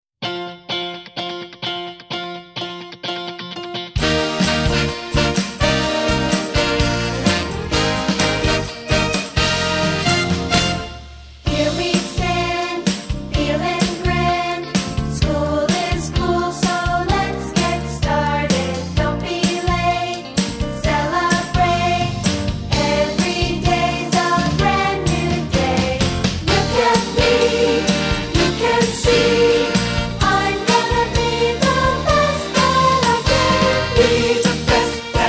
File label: Best I Can Be Vocal